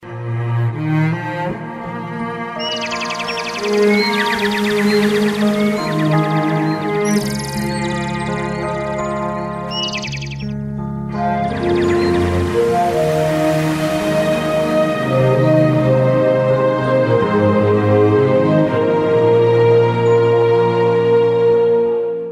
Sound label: Calm Morning